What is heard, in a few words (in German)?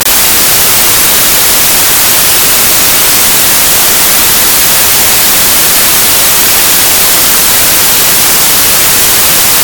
Vespern Musik